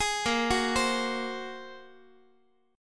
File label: Bb 7th Suspended 2nd Sharp 5th Bb, Guitar 4A 4E 5A 5D 5G sharp 6C sharp; Bb7sus2#5; arpeggiated; x,x,11,8,10,11; JGuitar